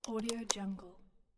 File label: small lockbox clamp; stormwaveaudio